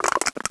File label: crush